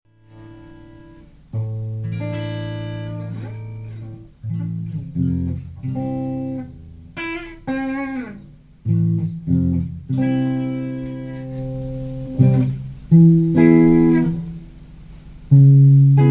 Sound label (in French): Impro guitare